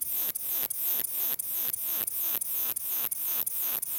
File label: song convexa, katydids neduba